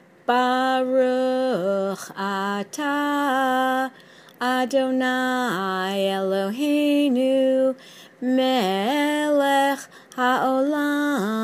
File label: haftarah blessing before the reading